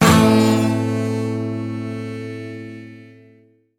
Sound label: buzzy